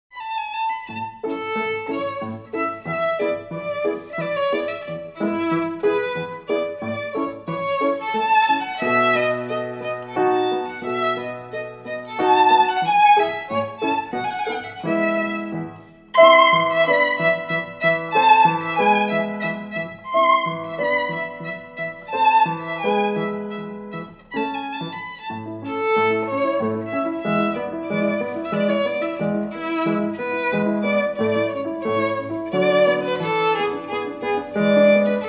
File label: Minuet